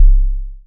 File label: analog tonal, kick; Ableton Foundation FreePacks